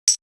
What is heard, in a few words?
Tambourine; Coldcut's Kleptomania VOL1